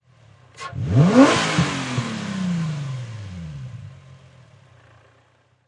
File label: Infinity G35 Engine Single Rev, High Performance Car; Auto, Power Car; Infinity G35. Engine perspective. Motor revving, single rev; Race Car